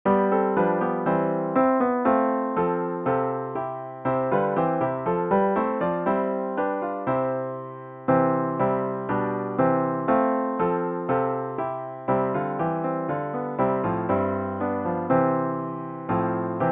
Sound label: Jesus Christ Is Risen Today piano with Intro; easter sunday; worship service